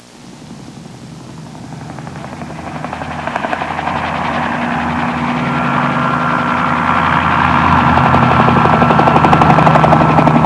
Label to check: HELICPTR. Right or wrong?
Right